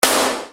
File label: Snare Dr